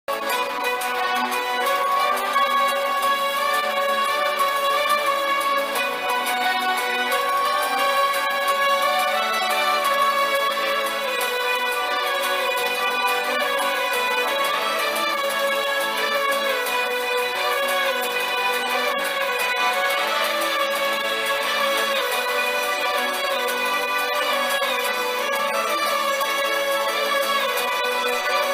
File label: climax ringtone climax bgm; thank you; naga chaitanya; raashi khanna